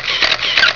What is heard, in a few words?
Camera; Mosaic Magic